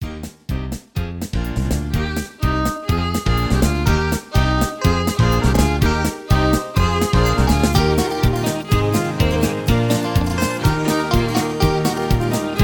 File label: Instrumental High Key